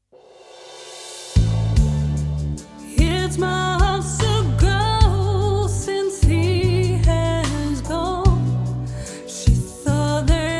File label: Reverb Lexicon 480L